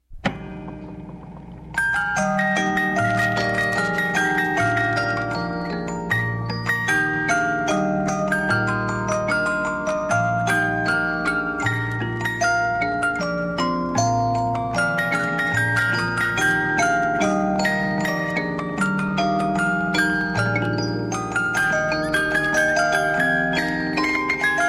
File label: music box